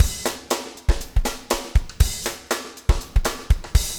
breakout 120, 120bpm loops

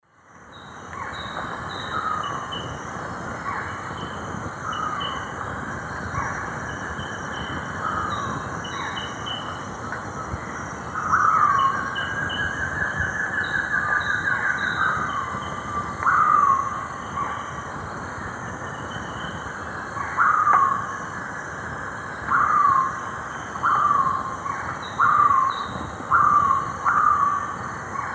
Nyctiphrynus ocellatus, fast song; S. America; Eastern Lowlands

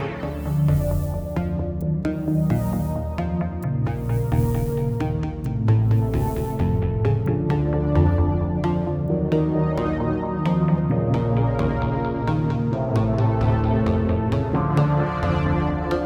Pad; Dance

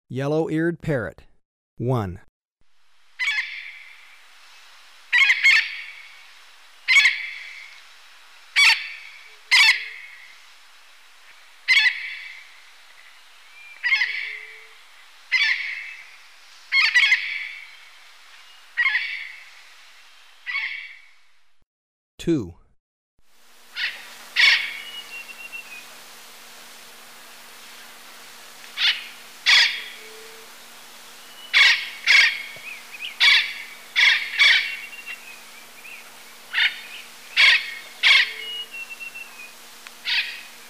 Yellow-Eared Parrot; S. America

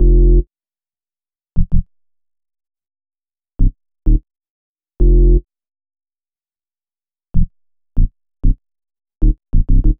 Timecode, Hip-Hop, 96

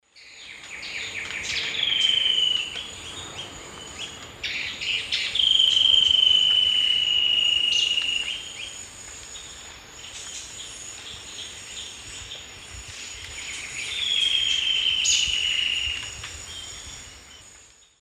Ramphocaenus melanurus, song; S. America; Eastern Lowlands

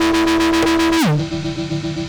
Hyperfuzz, Guitars

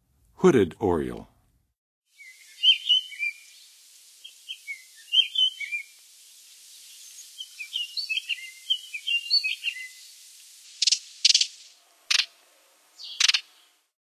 Hooded Oriole; North America; Western USA; Western USA Songbirds

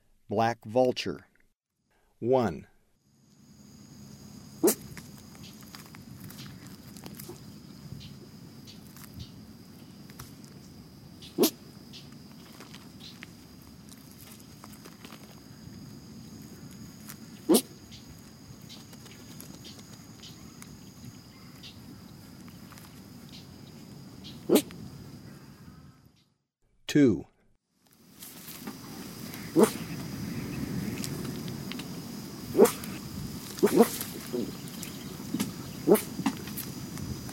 Black Vulture; S. America; Eastern Lowlands

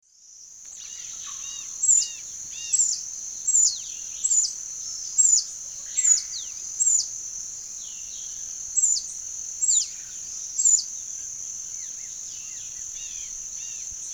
Leucippus chlorocercus, song; S. America; Eastern Lowlands

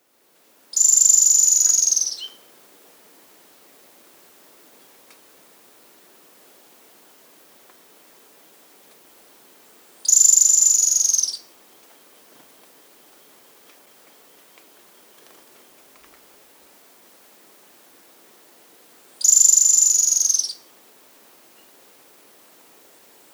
Spotted Barbtail; Premnoplex Brunnes; S. America

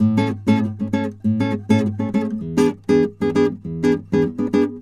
Guitar, Acoustic

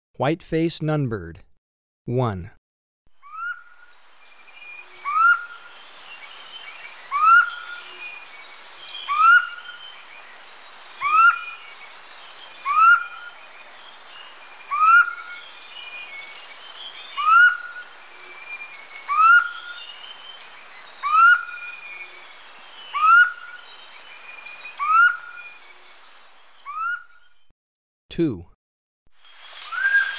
White-faced Nunbird, Birds of Ecuador; S. America

